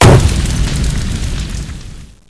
Fire Molotov Cocktail

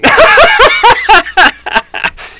cheer; zmParadise

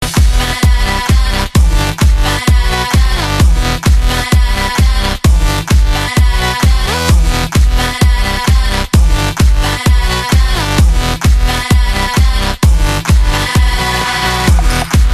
retro

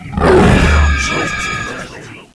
monsters death; oblivion darkangels

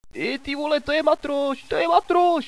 cough, hostage; cstrike

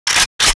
g3sg1 slide

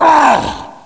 Pain, zombie; cstrike; Nemesis

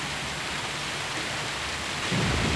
thunder rain

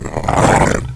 nemesis pain

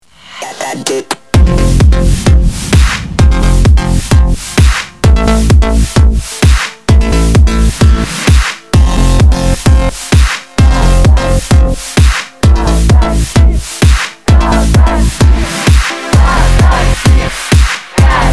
surf

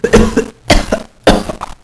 cough; zombie plague

